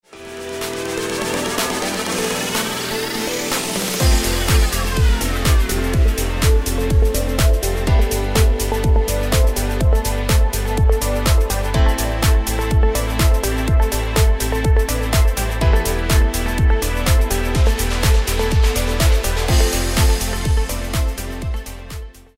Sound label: Modern Pop Lifted Higher